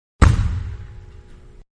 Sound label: Volleyball Indoors-Serve; Miscellaneous Sports; Manhattan Production Music